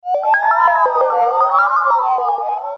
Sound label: dizzy dial